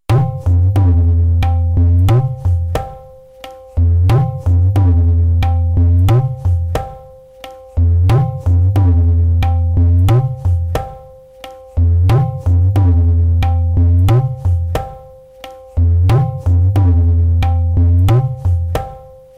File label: dadra madhya 90bpm